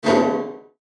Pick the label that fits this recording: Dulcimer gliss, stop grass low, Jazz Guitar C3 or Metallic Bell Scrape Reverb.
Metallic Bell Scrape Reverb